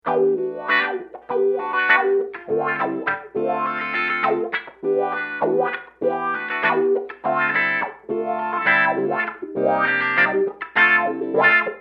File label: wah; Modified V847 Wah-wah; pretty guitar - wah - Bulldog cab sim - software reverb